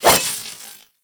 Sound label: Nikana Swing; Weapons; Warframe; Tenno; Revenant